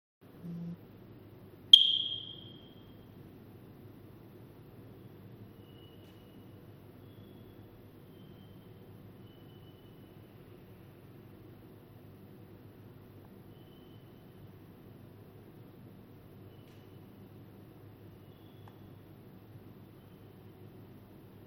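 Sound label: Battery low signal, smoke detector; Sensys-2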